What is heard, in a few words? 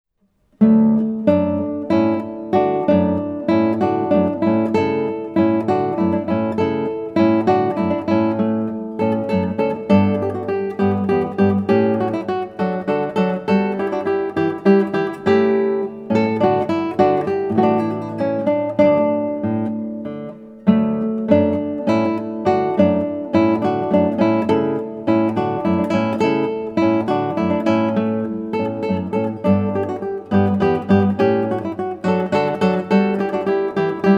Hornpipe guitar